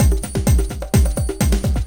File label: tribe, drums; TRIBAL 128; Loopasonic